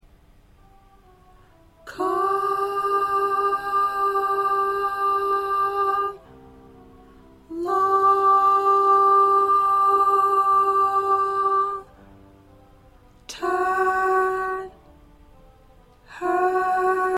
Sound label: Soprano